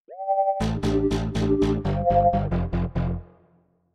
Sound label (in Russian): сбивка; audiobrending dlya podcasta